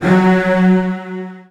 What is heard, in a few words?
Virtuoso Strings; ILIO; Kirk Hunter; CL 16TH